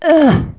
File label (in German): Stoehn